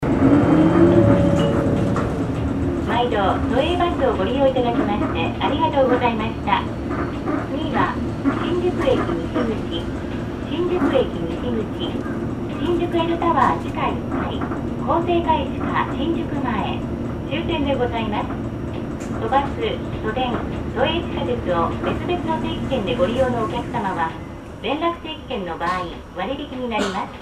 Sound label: bus tape orgel; tokyo; minkuru